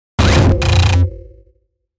Hit Glitch Stutter Fast Noise; Blastwave

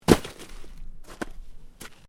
Body Movement Snow Lift; Body movement foley to simulate falls and deaths; Human

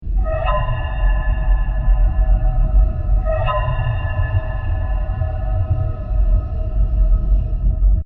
Scary Ambience With Sci-Fi Sirens 120bpm

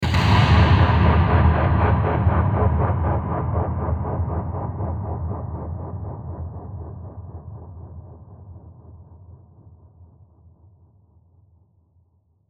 Hit Drum Mysterious Dark Delay; Blastwave

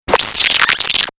Turntable Scratching Reverse; DJ; Sound Effects:Musical

